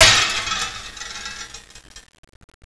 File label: bust glass, debris